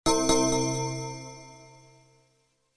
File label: Bells